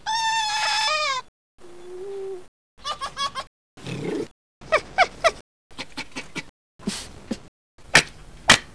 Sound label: Vulpes vulpes rep; The eight call types, produced toward people by silver foxes: whine, moo, cackle, growl, bark, pant, snort, cough